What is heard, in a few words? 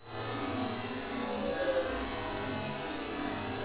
flag room hum